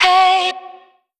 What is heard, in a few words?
Omnivox oneshot F#, Vocal; One Shots; Slate Digital Omnivox Vocal Sample Pack